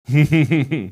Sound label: nose laugh